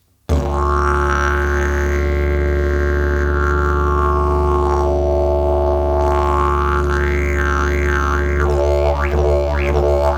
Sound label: didgeridoo; jilm fajkofon